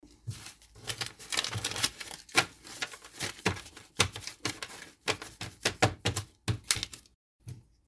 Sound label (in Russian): dengi vzjali so stola sobrali v pachku i obernuli rezinkoj; Звук купюр, долларов